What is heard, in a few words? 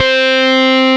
C-4 GRUNGE B, GRUNGE BASS; AKAI S-Series CD-ROM Sound Library VOL-7